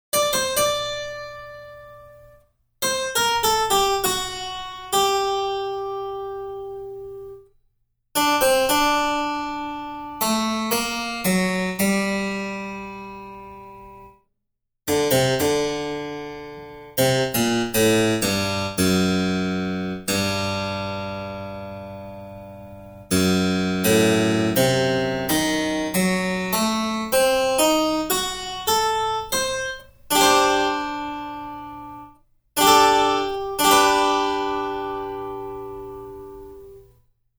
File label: Harpsichord